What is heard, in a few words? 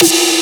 Snare; 7rystan's 600+ FREE EDM SAMPLES & DRUMS; Percussion